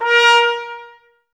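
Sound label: brass trumpets A#; Miroslav Vitous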